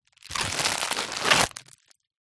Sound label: first aid kit pouch fabric cellephane wrapped bandages remove